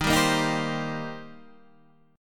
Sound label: Eb 6th Eb down Guitar Standard